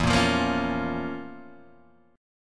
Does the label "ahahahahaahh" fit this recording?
No